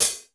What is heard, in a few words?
ROOM HAT, ROOM KITS; Crypton - DrumBase5000